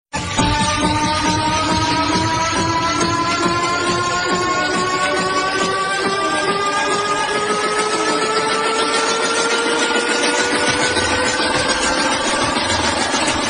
High Beats BGM

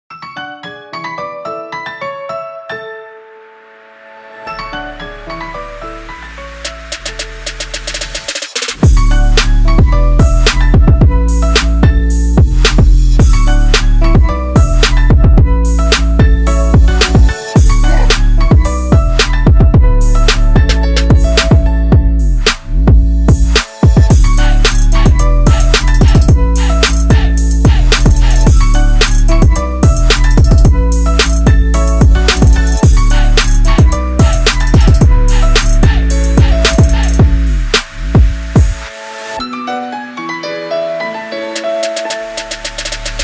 Original Bass Remix Ringtone; Nokia

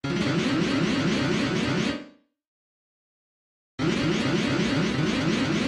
Sms Ringtone Drone

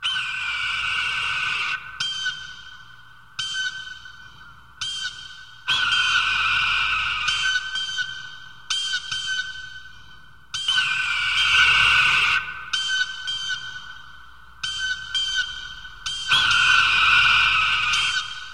Eagle Loud Voice

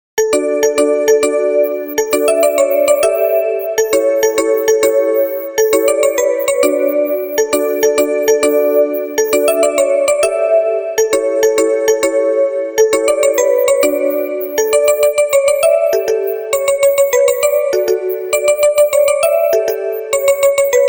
Mexican Dance Ringtone

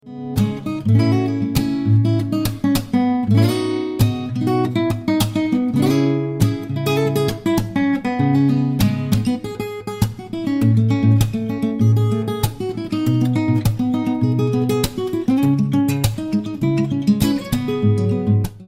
Guitar Romantic Ringtone

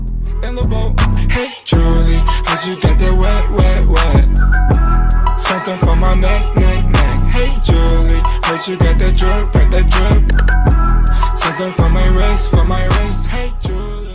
English Ringtone

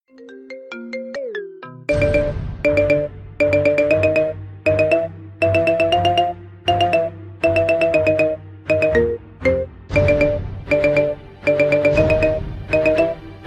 Marimba ringtones

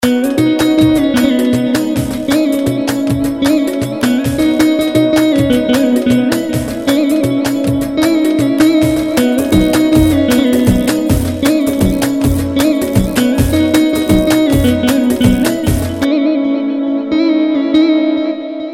Oppo F11 Pro Tone

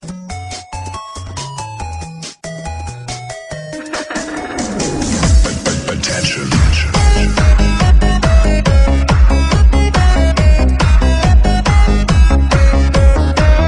Top Electro Box Ringtone

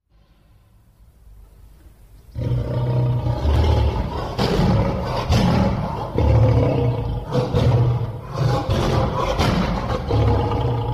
Roar Of The Lion Ringtone

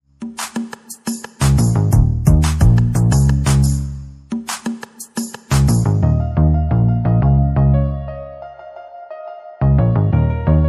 Instrumental Ringtone

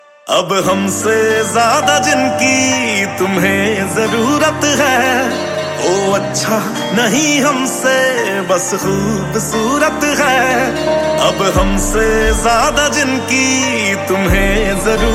Punjabi Ringtone

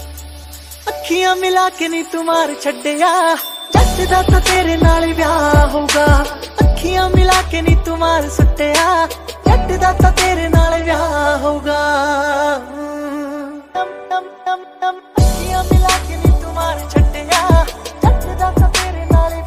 Punjabi Song Ringtone

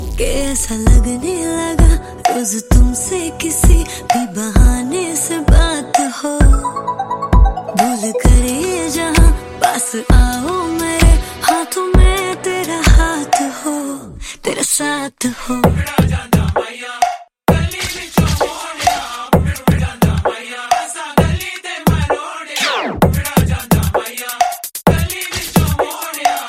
Punjabi song

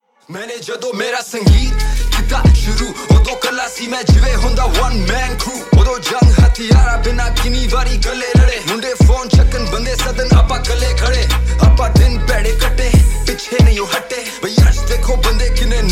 Rap Punjabi Ringtone

